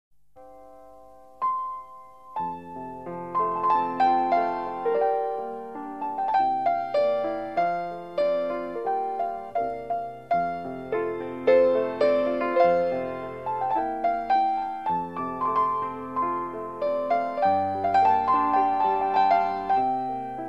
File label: Piano Orchesta; Sai Yoke